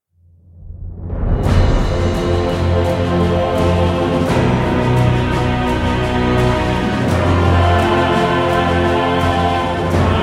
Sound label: combat light; arathi